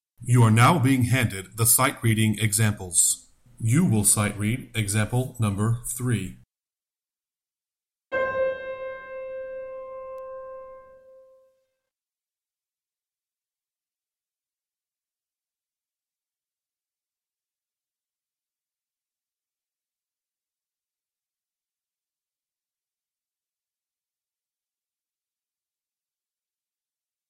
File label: Sightreading Example Soprano